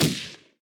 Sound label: Shootgun